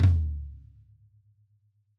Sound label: Floor, Drumkits